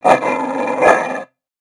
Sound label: Pet Dog Robotic Angry; creatures; pets; Lego